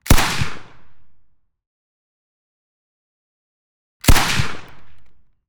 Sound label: L110A2 LMG 5.56mm belt fed right Single shots; FN MINIMI; 1m; MKH8040